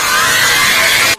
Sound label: raaaaaaahhhhh